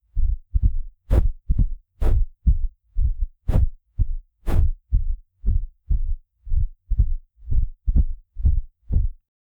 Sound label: heavy breathing subtle